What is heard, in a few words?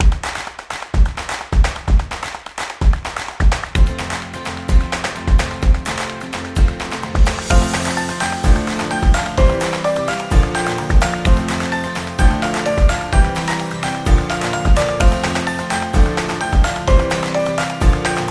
Pizza, ukulele